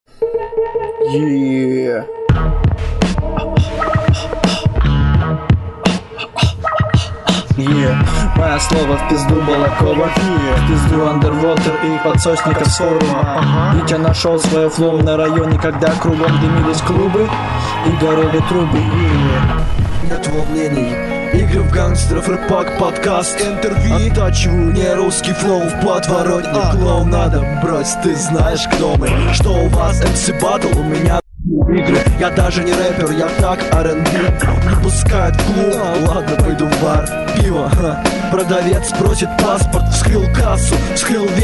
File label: drum hh battle